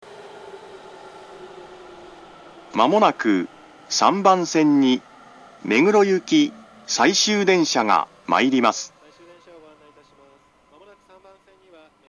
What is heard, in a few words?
local meguro last, station; tokyu-corporation; musashi-kosugi